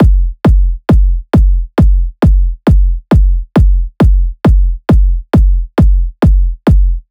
Kick Loop 135, Techno Drums; Ghosthack; Techno Sounds 2025

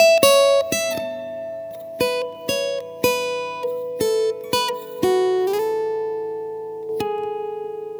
Guitar - 120 BPM (A Major), Acoustic Guitar; GhostHack; Free Guitar And Piano Samples

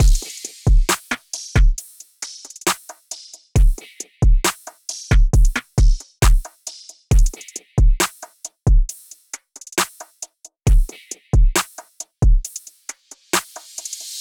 Drum Loop 135 BPM; Chemist; Cymatics; DOPE DRUMS VOL. 1

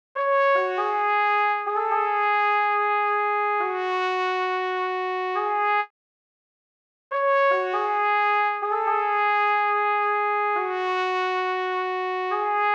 138 BPM C# Min Horn, Loop Stems; Cymatics; 2022 Melody Mystery Expansion

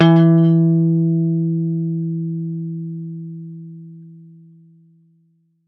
Acoustic Guitar Pluck - E; Instruments; Guitars; Cymatics; Light Free Sample Pack; Bonus Samples

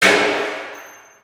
fx hit, Cartoon Film Effects

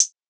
Closed Hihat; Cymatics; Eternity Sample Pack; Drum One Shots; Cymbals

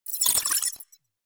Glitch

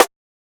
Snare

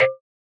Percussion, Hip Hop; Cymatics; 9God Hip Hop Sample Pack

